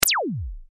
Laser Gun; lasers

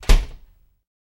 Door Push Bar Door Cl

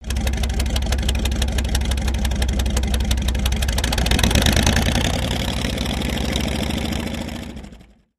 Military Vehicle Engi

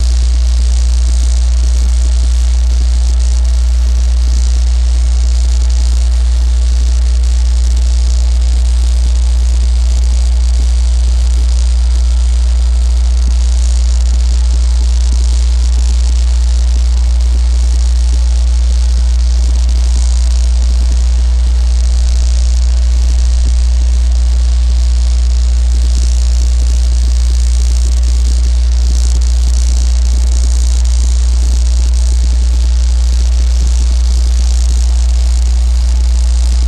Dam Power, buzz & hums; Guri Dam Power Distr

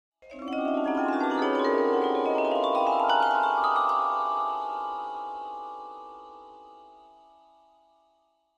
Vibraphone Magical, mallets vibraphone

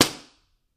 Book Slam Slap Hard

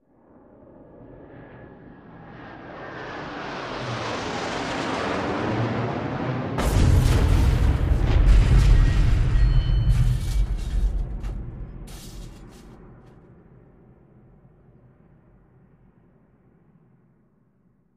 AIRSTRIKE

